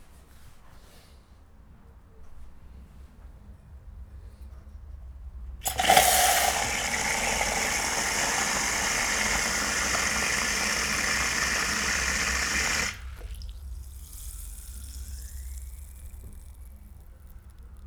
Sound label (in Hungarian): egeszen jo, szodaviz